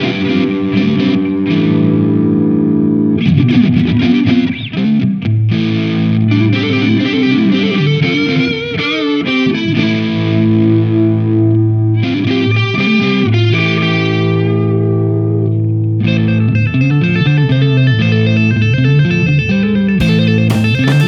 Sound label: Fender American Original 60 Jazzmaster Neck Pickup Both circuits